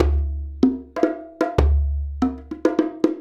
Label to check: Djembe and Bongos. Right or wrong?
Right